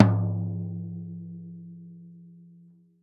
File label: floor